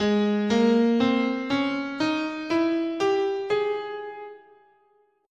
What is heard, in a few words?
Harmonic Major, scale, guitar; Gsharp